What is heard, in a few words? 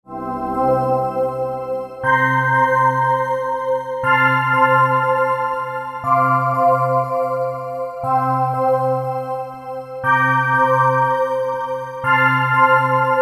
Bed Bells Pad; Bed Bells Pad Sequence; Bed Sound Effects